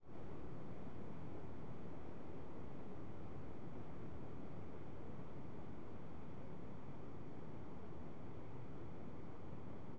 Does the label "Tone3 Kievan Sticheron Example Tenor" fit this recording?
No